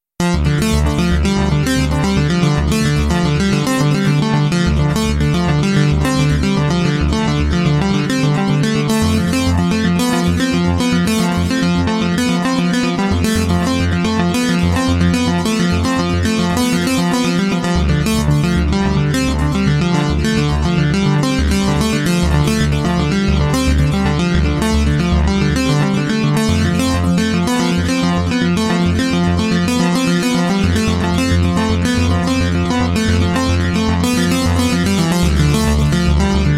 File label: SEQ DDL LEAD